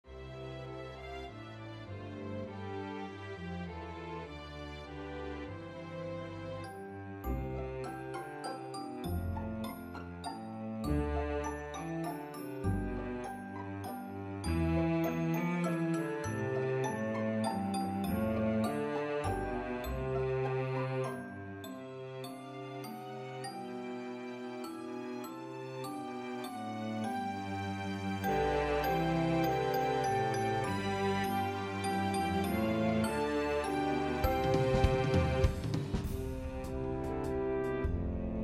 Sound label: Cello Track with Melody; We Wish You A Merry Christmas